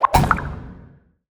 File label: Character Spawn; portal quest android